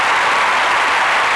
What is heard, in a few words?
CROWD LOOP